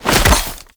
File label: Glaive Catch; Enemies; Weapons; Warframe; Teshin; DE